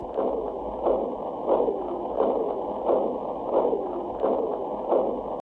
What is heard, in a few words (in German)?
Herzschlag